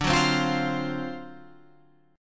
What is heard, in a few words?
D 7th Sharp 9th D down Guitar Open F; D7#9 strummed